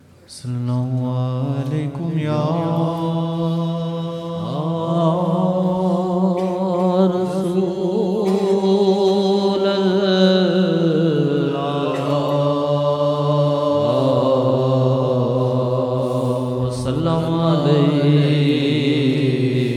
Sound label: naat; sin tuwadi ghulami karni ae te jag nu pairan vich rakhna ae